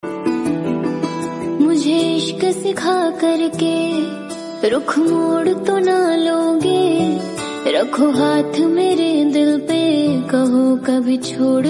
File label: Female Ringtone